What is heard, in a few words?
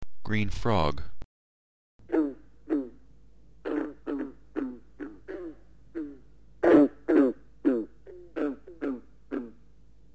green frog